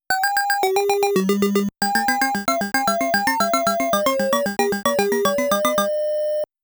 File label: Start Music; game sound effect; Dig Dug